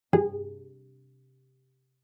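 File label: Cello pizz fortissimo sul D Ab4; MIS Pitches - 2014; Strings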